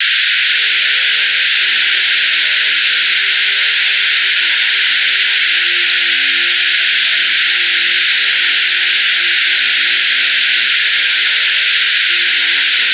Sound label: Rand Noisy Signal